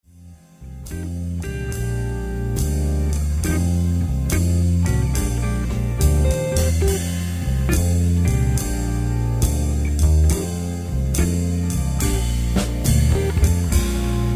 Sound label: Two-Five Blues